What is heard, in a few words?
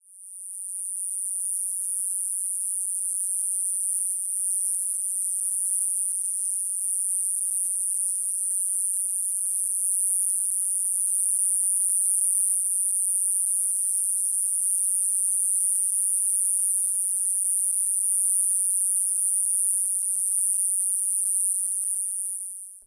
Okanagana hesperia, cicadas songs; US